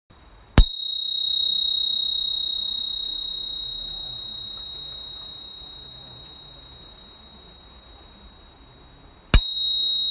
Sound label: Bell, bike; Spurcycle